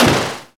barrel out kicks; HeavenStudioPlus; karateman

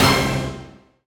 answer Reveal; HeavenStudioPlus; quiz Show

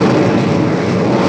Rocket; HeavenStudioPlus; working Dough

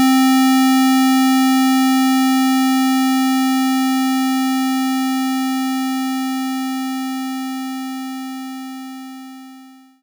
group step; HeavenStudioPlus; catchy Tune

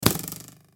bow hit; Mineclonia; mobs mc

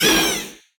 loud wail start; HeavenStudioPlus; gleeClub

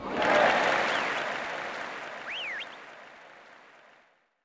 cheer; Heaven Studio Plus; dj School

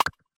miss; drumming Practice

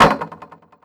launch Board; samurai Slice Ntr

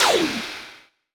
crouch; HeavenStudioPlus; airboarder

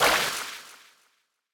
quick; HeavenStudioPlus; catch Of The Day

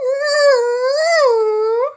drowning; sm64coopdx; char-select-extra-chars; birdo

